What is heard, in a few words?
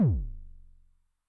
BD MOD [PItch+Depth], 909mod, BASS DRUM